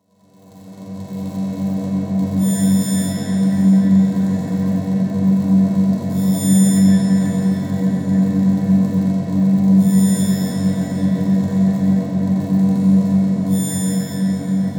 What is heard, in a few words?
Sci FI Amb